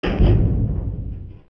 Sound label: Trap Door; Shadow Temple; zelda; OOT